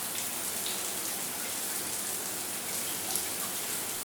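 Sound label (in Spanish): DUCHA LOOP, shower loop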